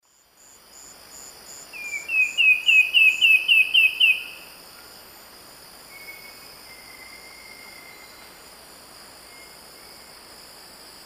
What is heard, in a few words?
Myrmeciza fortis, song; S. America; Eastern Lowlands